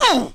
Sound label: Male Grunt